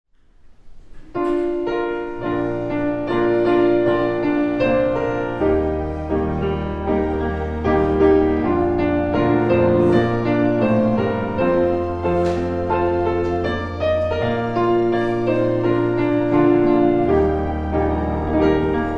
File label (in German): Vorspiel